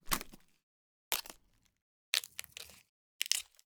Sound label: Vegetable Carnage, Cabbage, Snap, Short Length, Light Strength, 4 variations; Jeffrey Mengyan